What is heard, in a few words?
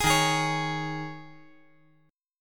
D sharp 7th Suspended 4th